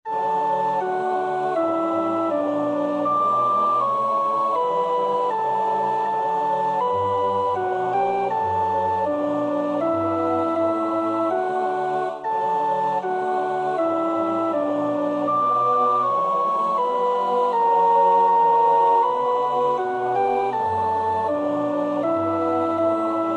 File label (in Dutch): Hadst thou been there, sopraan; Somervell (Arthur); The Passion of Christ